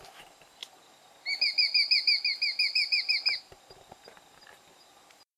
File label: Sharp shinned hawk; پرنده باز ساقتیز